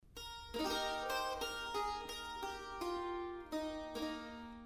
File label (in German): Cister Klang